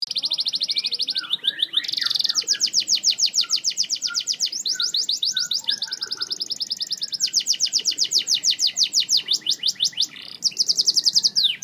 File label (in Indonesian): kenari snow white